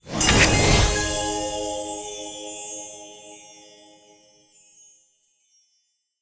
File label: bonus win; secrets of christmas